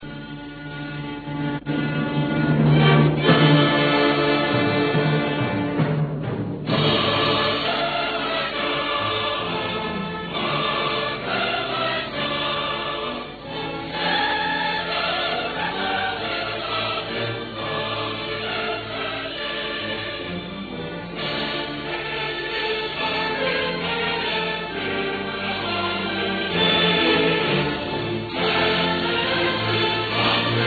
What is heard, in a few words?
Hymn